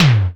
Tom, Roland Digital Drum Brain DDR-30

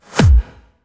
Medium, kicks

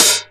Hat Open, Roland TR-707; Drum Samples

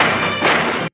Crunchy Hit; Sound FX

